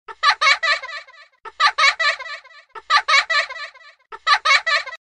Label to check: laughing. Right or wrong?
Right